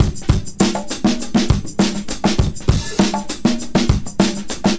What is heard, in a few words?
Combo, R&B Drums